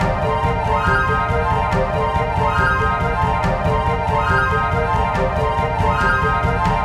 multiplier Beat

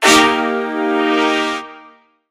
scatter notes; netent

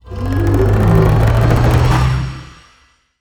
near win

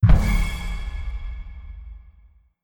wild Land; netent

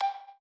count up Change; Stikky; netent

